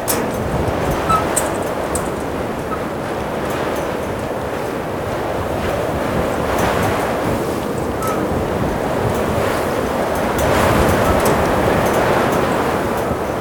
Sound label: desert wind high; ambient; Team Fortress 2